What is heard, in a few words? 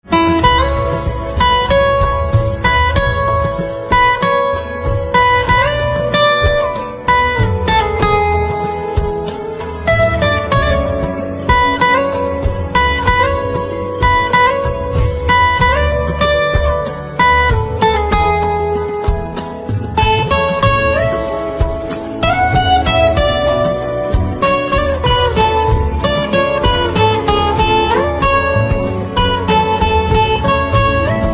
Guitar